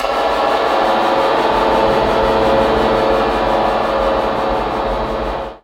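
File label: alarm siren, reverb processed, 170bpm; musicradar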